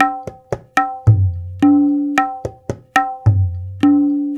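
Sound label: 110 bpm, tabla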